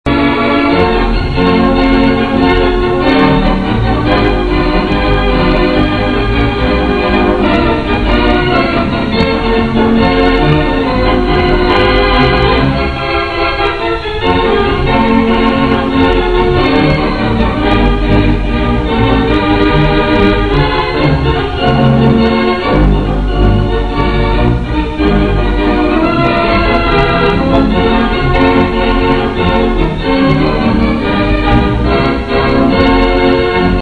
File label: halfstep, theatre organs